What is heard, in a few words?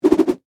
Whip Whoosh Multi, Throw; Foley; Heavy; Whip Sound Effects; Whips (Sound Effects:Objects); BlastWave